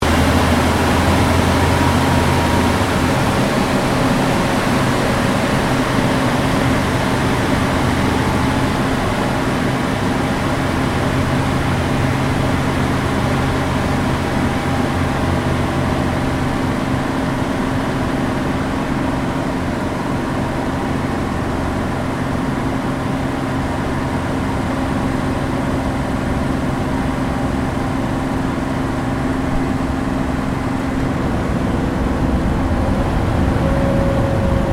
AIRCRAFT CAC CA-18 MUSTANG MK23 APPROACH LANDING INTERIOR; CA-18 /P-51 Mustang; Airplanes; Vehicles; Aircraft Collection; Twistedtracks